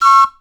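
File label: tri tone whistle; Voice Crystal - Steve Reid Definitive Percussion; ASRT WHISTLE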